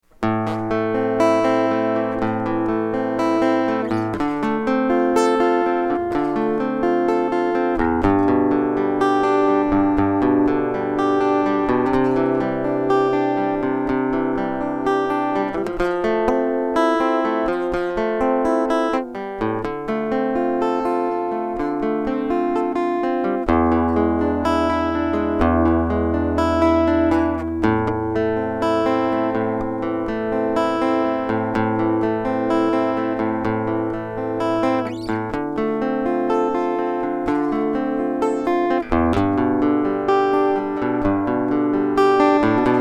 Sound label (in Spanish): Guitarra Menor